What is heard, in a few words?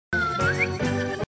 clapping; backyardigans